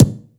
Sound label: KICK, Drum Machines Akai MPC2500